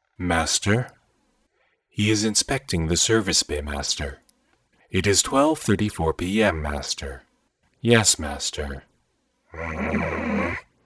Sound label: Flanger